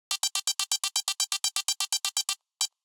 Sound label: DICE Electronic, Roll; Samples; ArticulatedSounds; DICE Sfx Party (Extended)